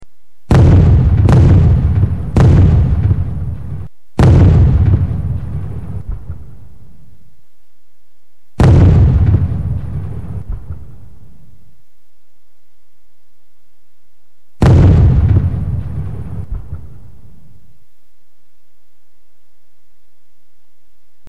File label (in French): canon